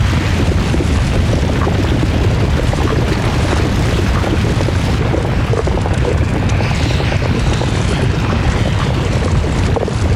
lava loop

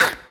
death; minigames; horizontal runner; mulliboom

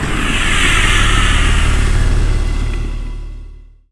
death card; mix

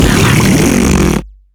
poop laser

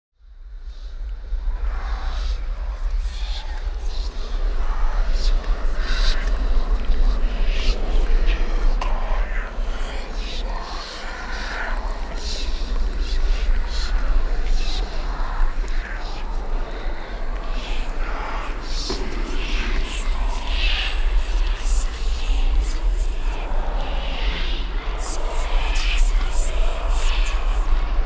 whispering wraiths; greed

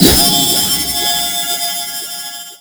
power up

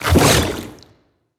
big jump; blastocyst

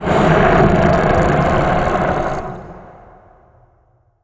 monster roar

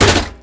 slot spawn

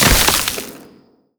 freeze

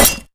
scythe break

